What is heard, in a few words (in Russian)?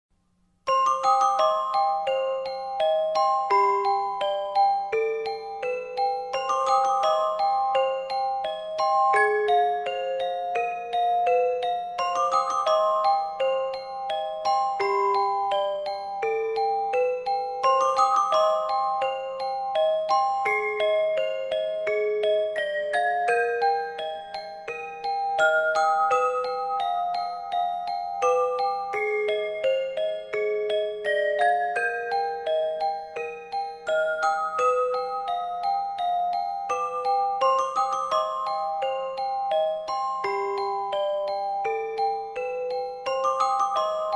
Детская мелодия